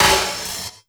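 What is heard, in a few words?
snare drum, drum & bass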